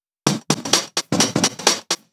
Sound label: Fill 128 BPM; Vengeance Samples; VEH1 Loops